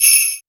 perc, Single Drums; percussion

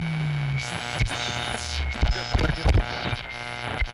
Radio

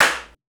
Phaser Clap, percussion

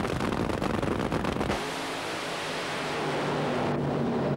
Dragster Start, FX Effect Sounds

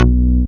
Swing Bass

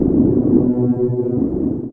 Stormy, baesse

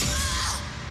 Bottle & Female Scream

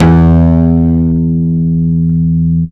Synth E Bass

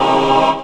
dance chord

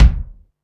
bd room; percussion

